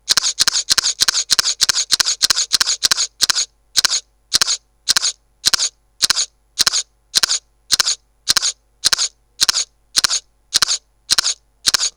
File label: Olympus E-520 continous